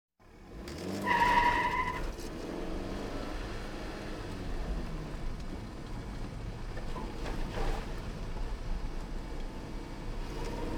Automobiles various

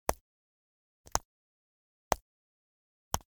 Generic Button Glass Small; boom library; The Interface

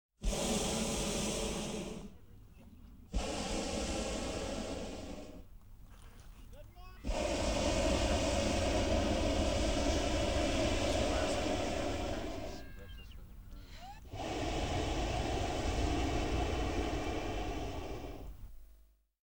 Hot Air Balloon Rising; KMP Film Video Sound Effects

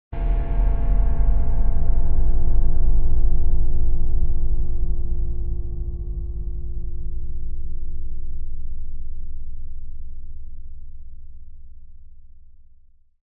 Bell Ring Big Ominous Low Tone; Hollywood Edge; sound designer's toolkit v2

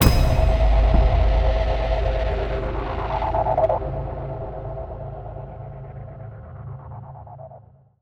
Grate Your Teeth, Cinematic Metal Impacts

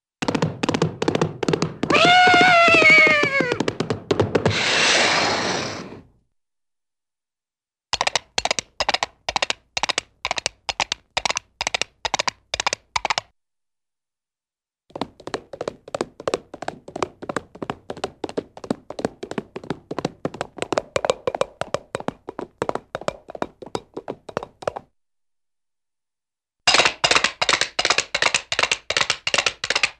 Funny Horse Hooves Sounds, Cartoon; Hollywood Edge; Cartoon Trax

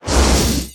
Abomination Ranged Magical; Kilta

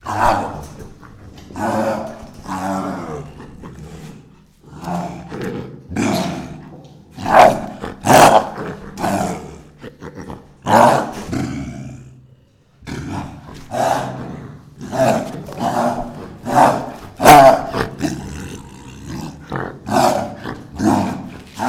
Reactive Tiger Makes Aggressive Roars; Tigers; Sound Ideas

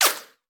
Bullet Ricochet; Sound Ideas; Guns

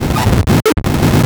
Static; Blastwave

